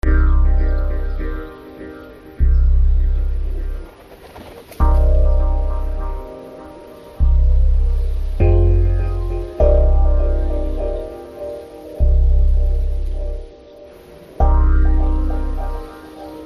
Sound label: ambiance